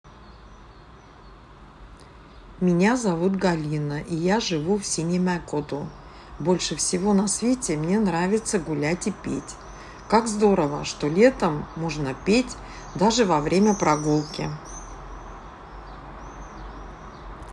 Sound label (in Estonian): laul